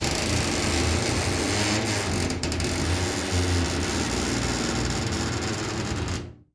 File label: Vault Outer Door Open; safes & vaults